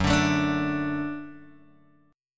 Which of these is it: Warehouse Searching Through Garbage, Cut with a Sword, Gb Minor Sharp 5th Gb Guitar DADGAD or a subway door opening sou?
Gb Minor Sharp 5th Gb Guitar DADGAD